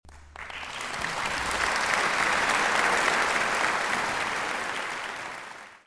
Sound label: claapinged, clapping